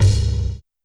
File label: BD Break; YAMAHA RM1X